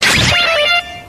insert signal bike; kamen rider mach chaser

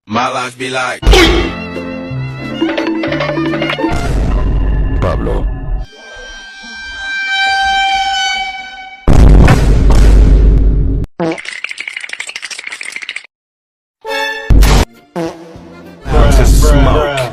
stupid goofy ahhh sounds

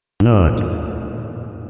nut w reverb

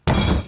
smashing table; gumball

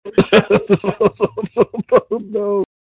laugh; roach

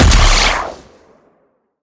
shot charged

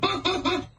hahaha

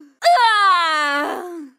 impaled scream; mk9; sonya blade

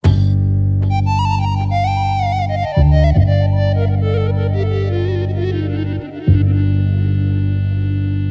beat

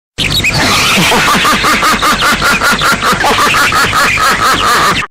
loud laugh

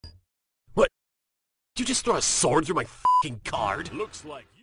sword through card

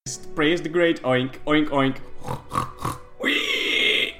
oink oink

